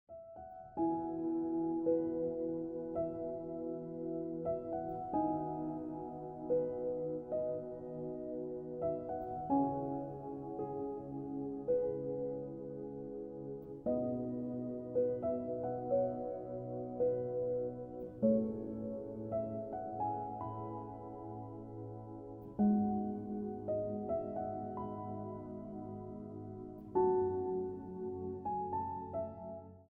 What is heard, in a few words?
Looking Back Ringtone Piano Music